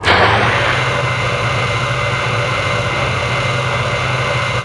truck; 1997 MMX LAN PACK (win 95)

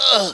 Prisoners Dying; 1997 MMX LAN PACK (win 95); Army Men

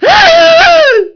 ahieee; Army Men; GreenUnits

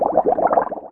DEATH; QUAKE 1; FISH

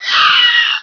PAIN; Quake 1; SHALRATH

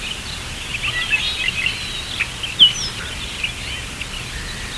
birds; 1997 MMX LAN PACK (win 95); Army Men

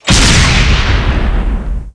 CAN FIRE; 1997 MMX LAN PACK (win 95)

